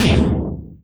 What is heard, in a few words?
DEEP HIT, Anime Sound FX; AKAI Anime Sound FX